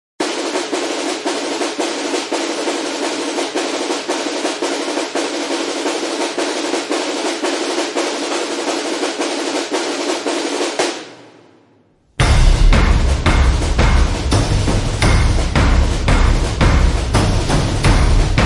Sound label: Percussion